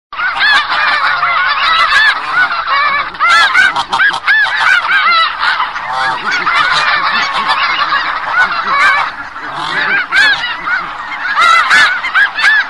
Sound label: Snow Goose Feeding Modified